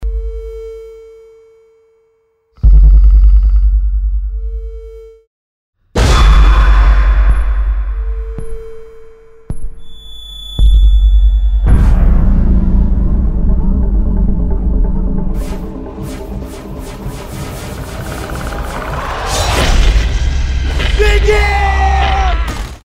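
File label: scary music insert; iz hishnika; Звуки ужаса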